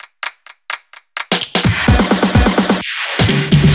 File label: Fill Ins 128BPM; Vengeance Samples